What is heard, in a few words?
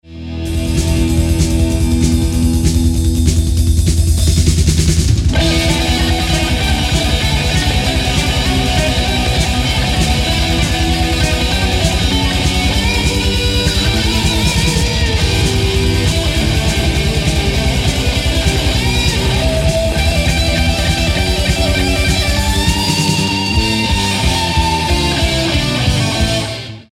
solo